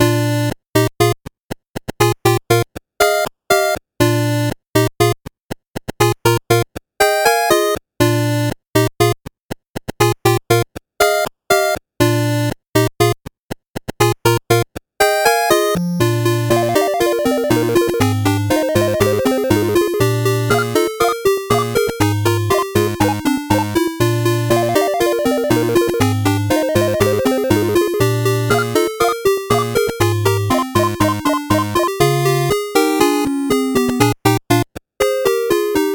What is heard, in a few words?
8bit bgm slow